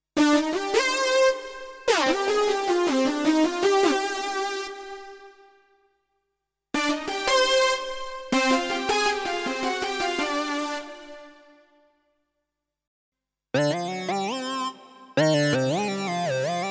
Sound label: Ketron SD9 Synthesizer Sounds